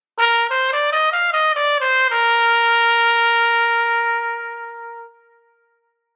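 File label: Horn legato velocity 120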